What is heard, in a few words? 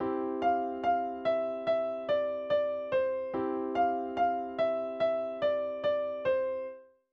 instrumental